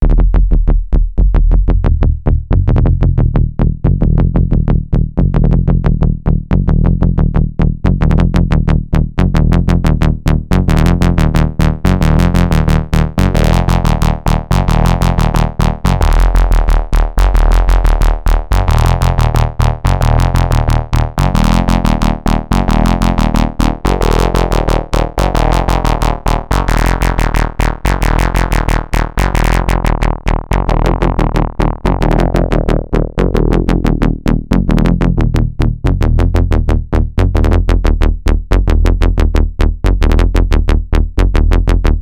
Moog swept filters

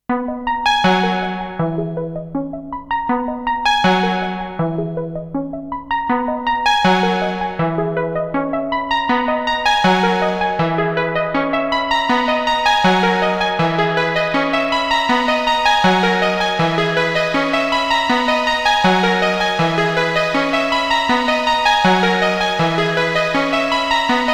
ASM Hydrasynth Envelope Filter

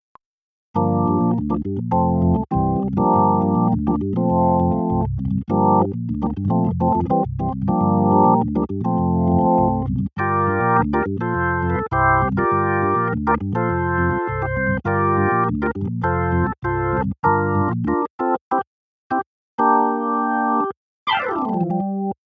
Nord Stage 4 B3

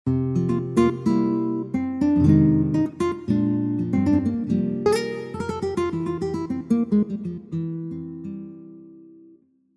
Classic Nylon Open; Yamaha Genos2 Demo

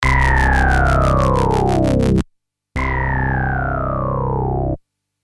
VCZ vs CZ

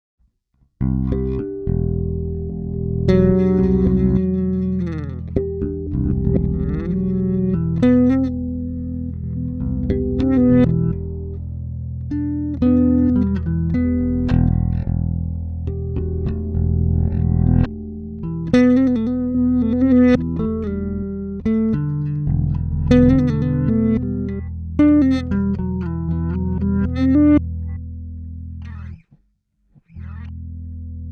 Bass reverse; ReTracer

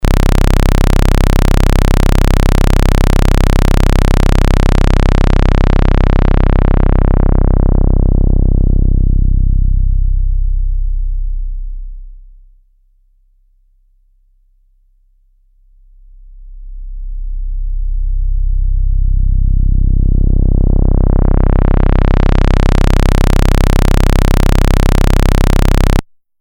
filter sweep

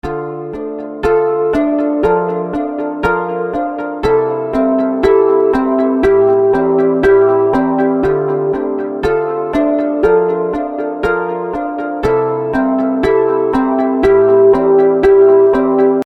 Pluck Delays

